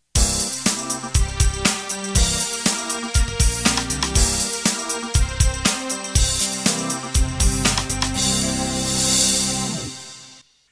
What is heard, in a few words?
Fusion End